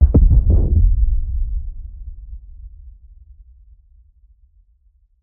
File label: sloth blowout, anomalies; Arrival